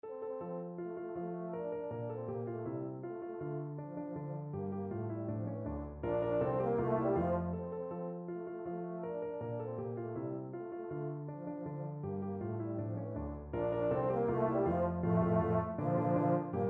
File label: EUPH, euphonium